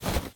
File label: flap